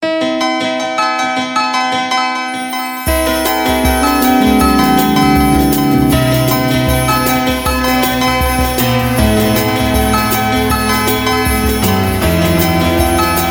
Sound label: new iphone ringtone; Iphone Ringtones